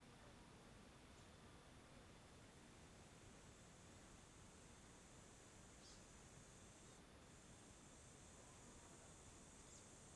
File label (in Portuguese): Ambiente natural a tarde com cigarra, vento e arvores; ranger de madeira